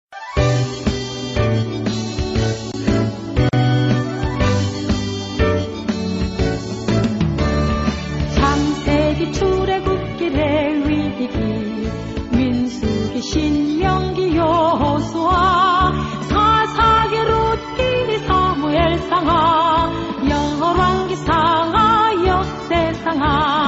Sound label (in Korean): 성경목록가 전체 여성